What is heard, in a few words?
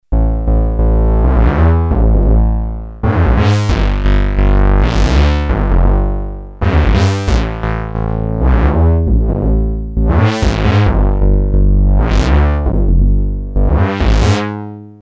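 bass, Polivoks duophonic synthesizer; Polivoks formanta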